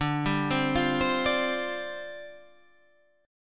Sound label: G 7th Suspended 4th D